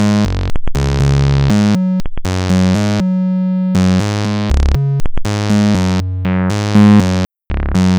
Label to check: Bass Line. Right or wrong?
Right